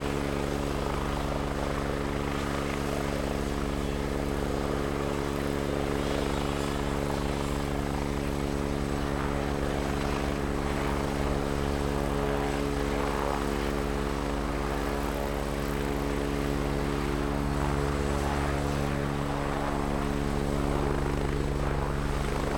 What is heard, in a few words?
Agusta A109 ext idle take off away; Pole Position; double ms CMC6